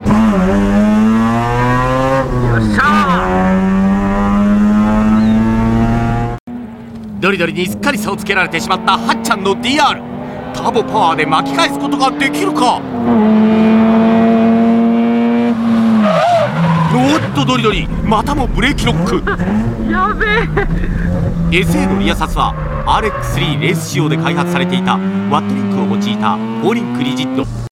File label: bridgeport, Rotary Sounds